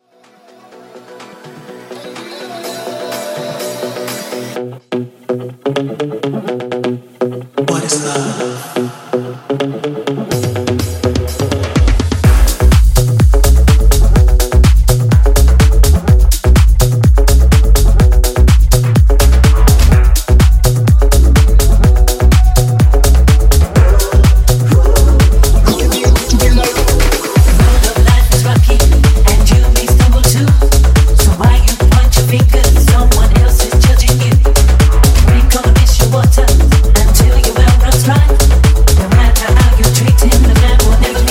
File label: Tech House Intro; Remixfreakz